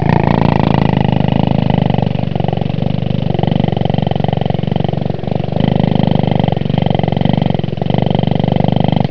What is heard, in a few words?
purr, Cat Sounds